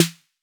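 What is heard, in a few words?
808 snare drum; Electronic; Wizoo - Platinum 24 Electronic; CHR SD 808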